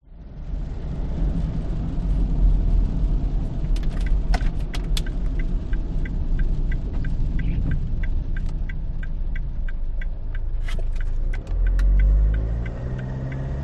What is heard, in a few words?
vehicles car suv driving light rain wipers 60kph